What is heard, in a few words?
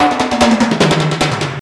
Roto Toms; Drums; Fills; Misc Fills